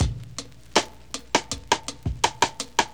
loop, breaks

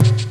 BD fx, industrial loops; USB Soundscan vol.24; AKAI

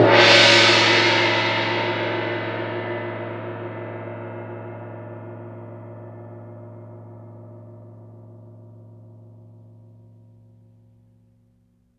CYM LRG, Gongs Ambo; Roland LCDP03 Orchestral Perc

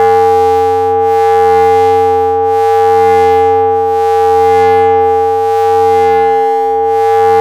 VCS RAW, VCS RAW WAVES; Zero-G Ambient 1

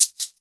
SHAKER; InVision Interactive; Percussion Slam

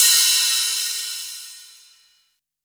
Hat, Drum & Bass

